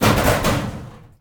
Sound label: Metal Rattle Laundry Chute; Foley - Rattles